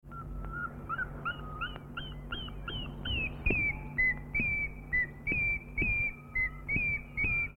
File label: tockus nasutus, birds calls; bucerotidae